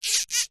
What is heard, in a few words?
plastic small strap movement squeak creak